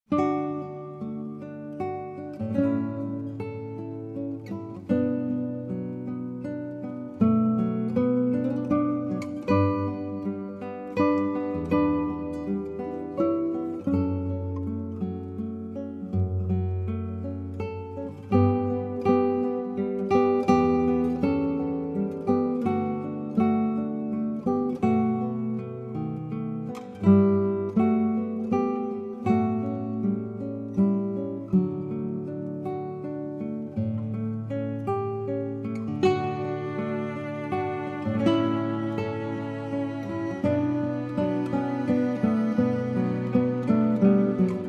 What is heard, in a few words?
Acoustic Praise Instrumental